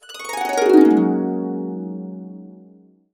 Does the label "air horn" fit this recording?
No